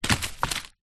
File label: large stick drop on ground; foley; zapsplat